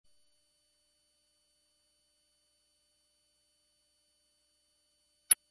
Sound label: Mosquito Sound